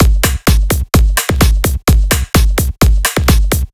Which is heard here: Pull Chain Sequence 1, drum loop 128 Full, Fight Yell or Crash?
drum loop 128 Full